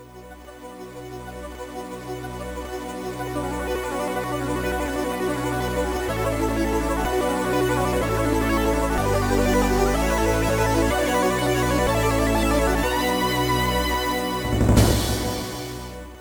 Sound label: jingle; MED; international open golf